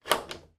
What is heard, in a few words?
household door bolt unlock